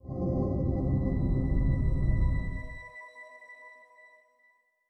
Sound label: OS2 Warp 8.0 Shutdown; Windows Never Released Soundpack 2